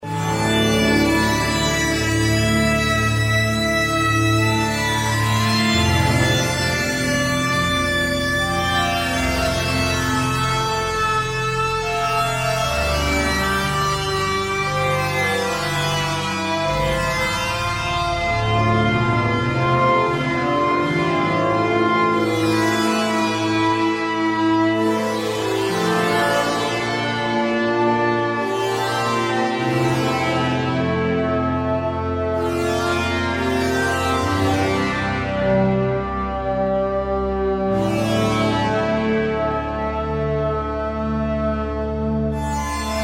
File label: 5ths